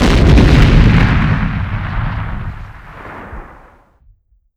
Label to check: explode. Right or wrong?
Right